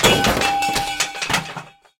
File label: streetlight fall